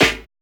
HOPPIN SN, HIP-HOP drums; Drums In Your FACE!

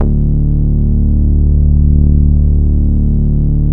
MOOG D2P; 90S MOOG

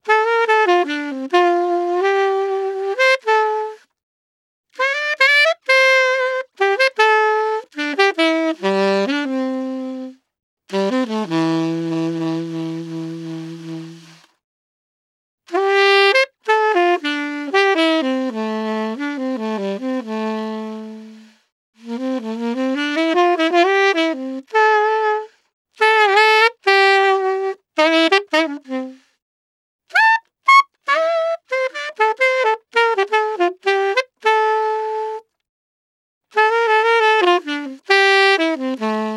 Solo Sax F Free; Quazibeat - African Secret; Saxaphone

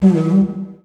DIGERIDOO; Best Service; World Colours

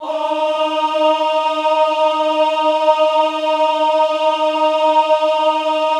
AHH D3, Classical Choir; Best Service; Extended Classical Choir; AHH MIX

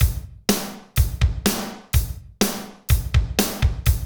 Medium Tekno, Drumscapes Roland; Northstar - Drumscapes Roland

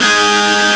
heavy; Amiga Soundtracker Sample Packs

